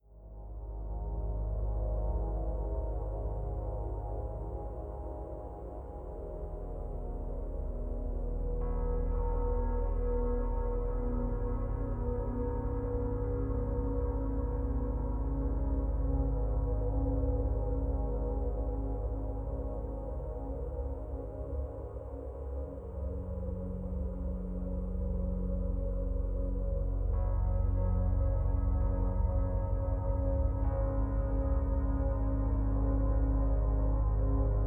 Alpha Waves

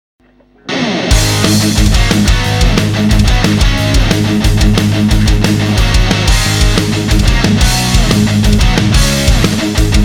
TS-808; Fast Sample

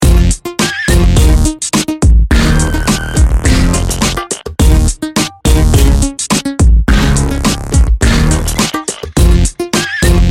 105bpm

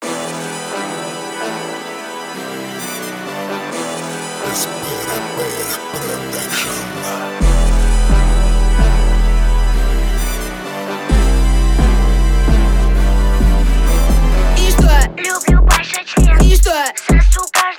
41-46Hz Low Bass

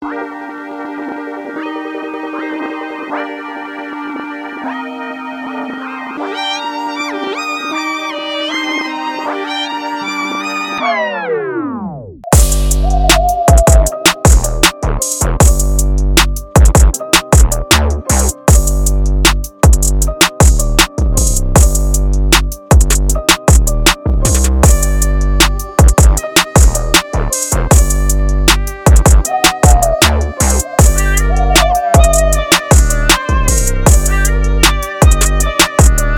156bpm

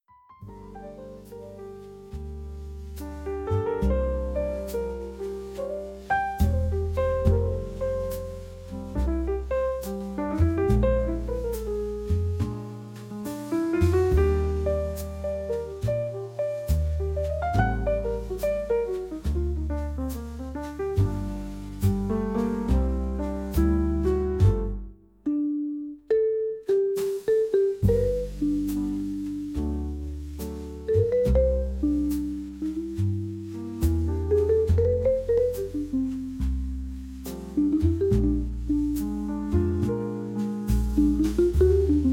Jazz Ambience

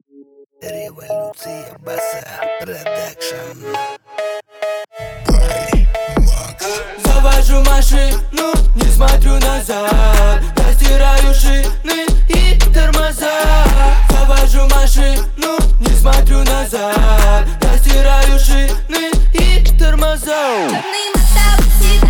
35-39Hz, Low Bass

